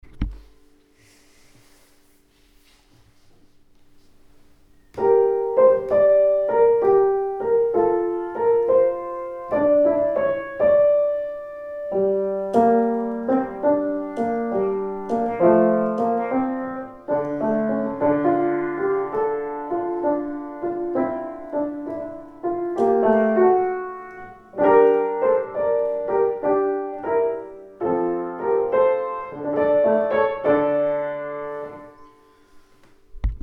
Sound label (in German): 4 stimmig a tempo; Übergang zum Leibfahnträger; Gabrieli; La Spiritata